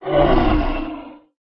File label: harpy warrior damage